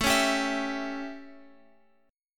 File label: A 7th Guitar Open F, chord; strummed